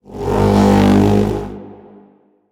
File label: Commanding Shout Cast Horn Sound